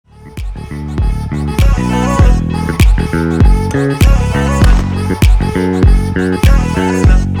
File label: cool ringtone